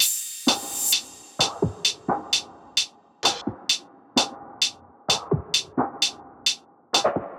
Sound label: Pop Kit 130bpm Top; EDM Drums; Drum Kits; Stems; Ghosthack; Drum Mega Bundle; Ultimate EDM Drums; Pop Kit 11